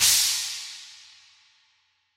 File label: cymbal bright; soundscrate